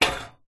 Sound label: metal frame short sharp bash hit; foley